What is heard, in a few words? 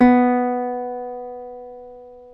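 B2 classic, classical guitar; Northstar - New Gold VOL-2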